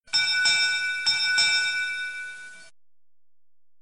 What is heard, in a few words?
ship bell